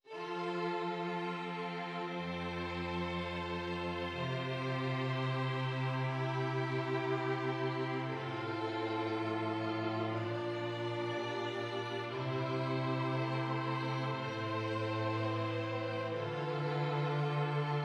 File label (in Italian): esercizi di contrappunto semplice A quattro voci